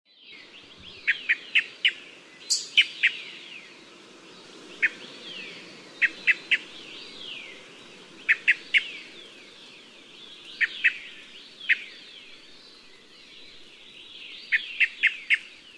白頭鶇; 島鶇; 鶇科/留鳥

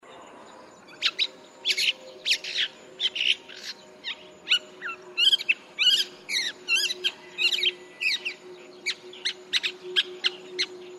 棕背伯勞; 伯勞科/冬候鳥、過境鳥

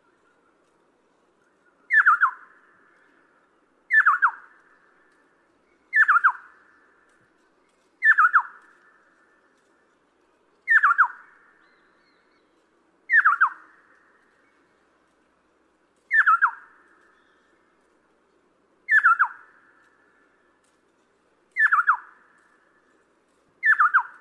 大彎嘴; 大彎嘴畫眉、斑胸鉤嘴眉; 畫眉科/留鳥

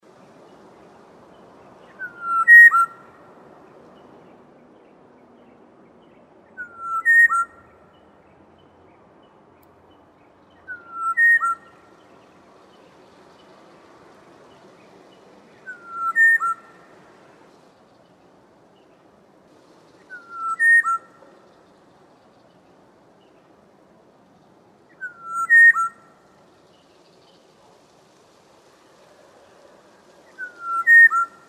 黃鸝; 黃鶯、黑枕黃鸝; 黃鸝科/留鳥、過境鳥

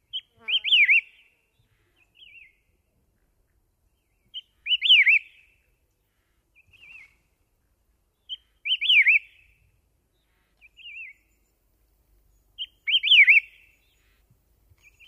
台灣噪眉; 金翼白眉、玉山噪眉; 噪眉科/留鳥

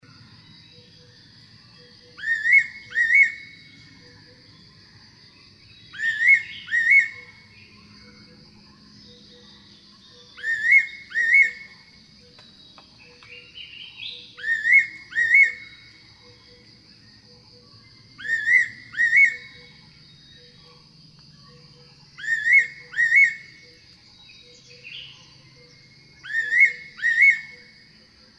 八色鳥; 仙八色鶇; 八色鳥科/夏候鳥

